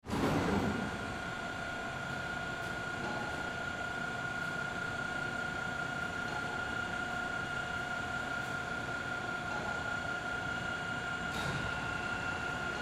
X-Ray Conveyor Belt Airport Luggage Metal Detector Alarm Beeps; X Ray Machine; Industry